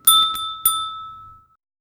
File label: a sound of a soft, instrument